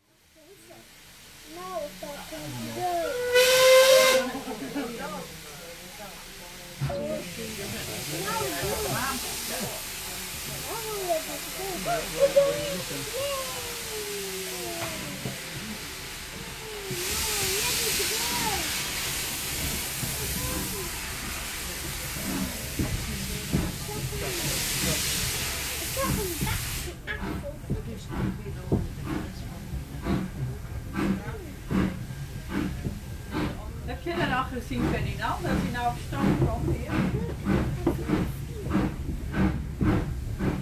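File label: steam engine, Caledonian Railway Class 812 0-6-0 leaving Broomhill station; no. 828; for Aviemore on 5 July 2017; Built 1899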